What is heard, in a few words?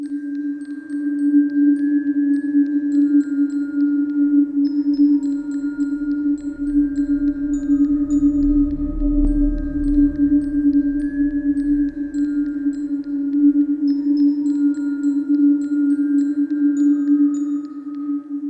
Doll Maker Music; Welcome to the Game II